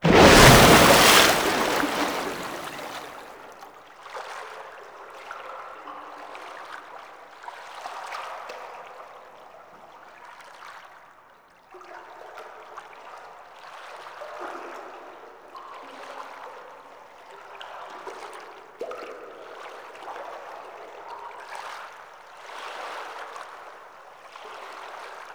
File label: Mercy Killing Cin Sfx; Warframe; Tau; Cinematics; MercyKillingPartTwo